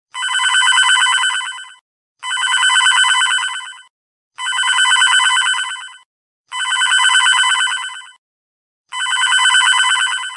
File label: Htc Alarm Sound